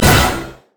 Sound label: shield refresh; Diana